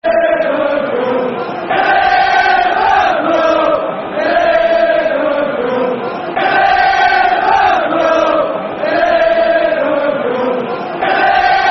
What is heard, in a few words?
Liverpool, Liverpool, Football Chants (Soccer Songs)